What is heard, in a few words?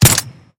scar ssr, guns; resurviv